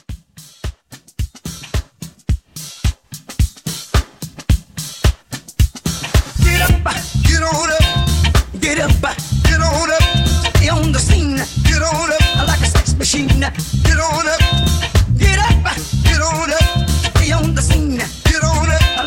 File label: 70s Re Drum